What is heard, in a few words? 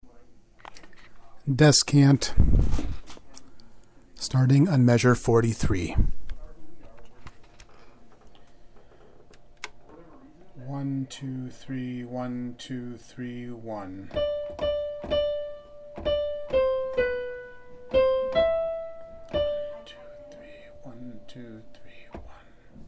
descant; Like a Deer